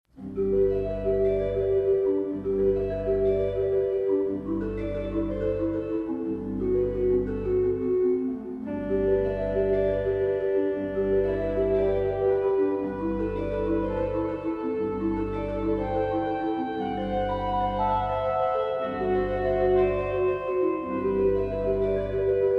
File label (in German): orgel; spaeth orgel